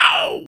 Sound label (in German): Effekt Sounds Mando